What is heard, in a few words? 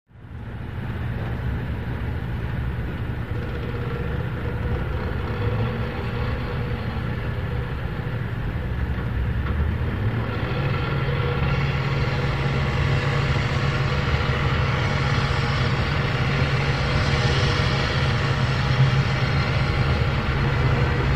Light Construction, construction large